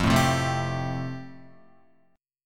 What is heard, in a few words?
F Major Add 9th down, Fadd9 chord, Guitar; strummed; JGuitar